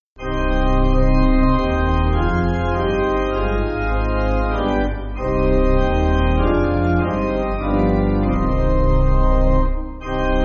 Pipe Organ, Backing Files for Churches; The Lamb Of God; Roy; Kr. Roy

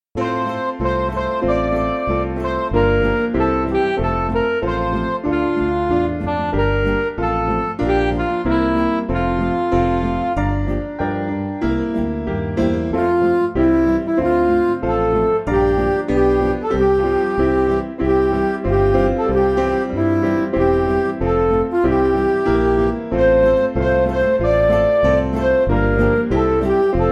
Midi; Fight The Good Fight; Shepton Beauchamp

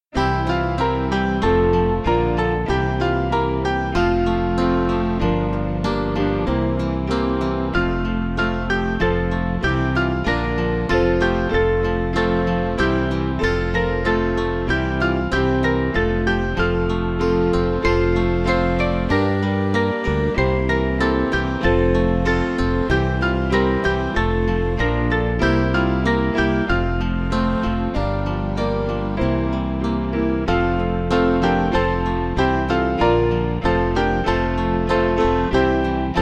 Band

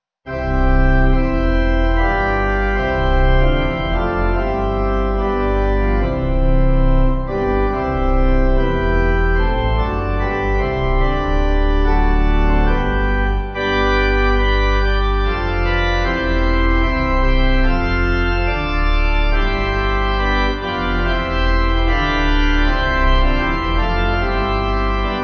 Pipe

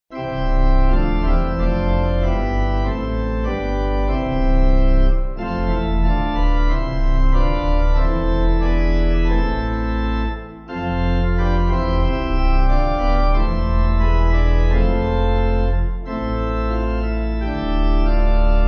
Holy Spirit Truth Pipe, Pipe Organ; Lew Trenchard

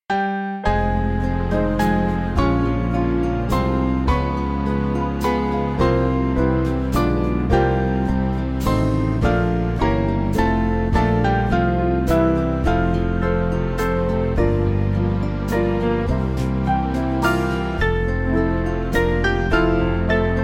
Band; O Trinity; Bromley

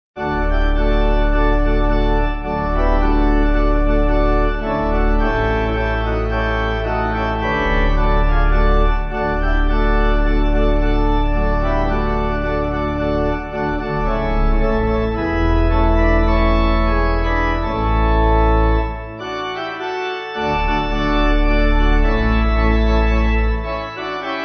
To The Work, Pipe

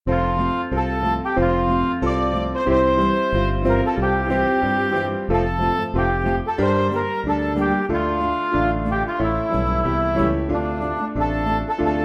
Midi

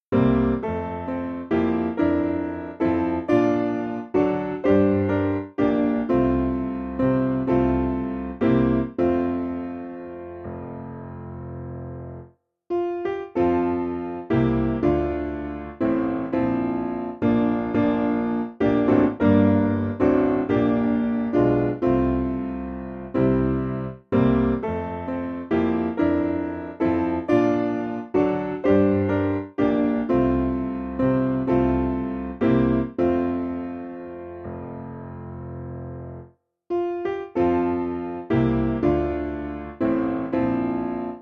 Begin My Tongue, Manoah, Piano

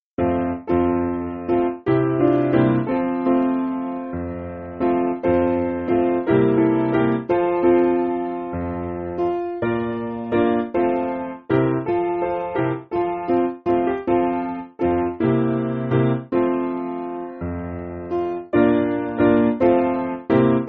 Piano

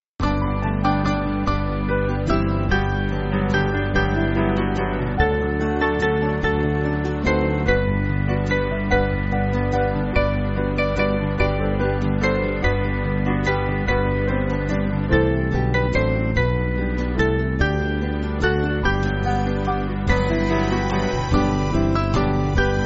More About Jesus, band; Sweney

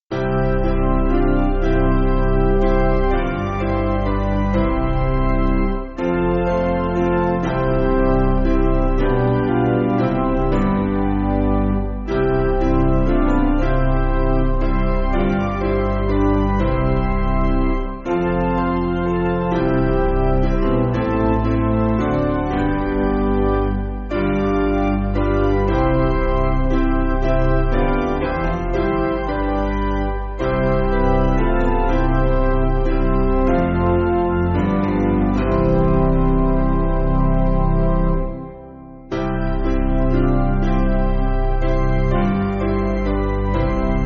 Wrecked Outright OPiano

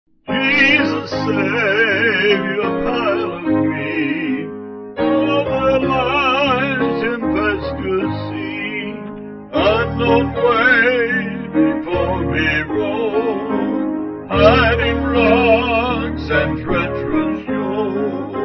Vocals Piano; Jesus Saviour Pilot; Gould

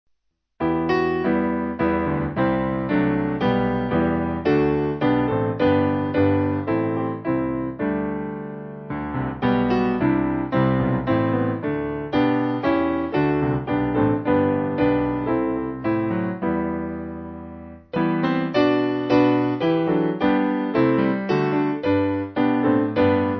piano

